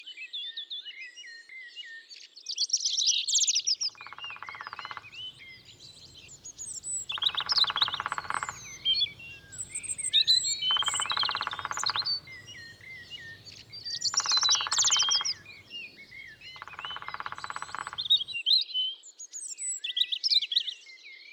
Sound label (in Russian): malii pestrii detel